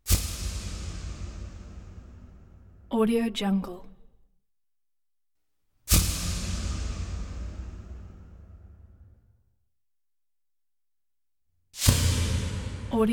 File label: magic transition; futuristic sounds